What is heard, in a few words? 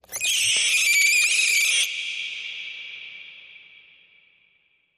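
creature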